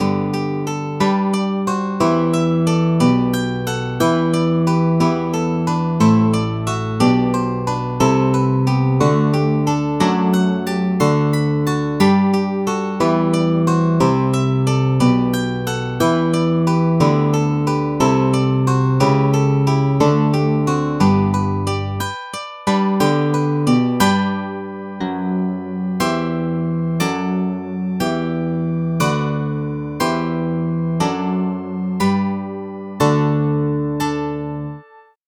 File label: nylon string guitar bach